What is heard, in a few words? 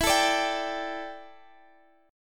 D Suspended 4th E, guitar; Dsus4/E; strummed